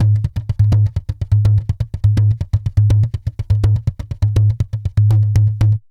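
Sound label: tantango groove; Best Service; World Colours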